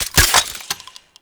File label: Four Barrel Rifle Reload